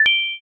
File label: notify like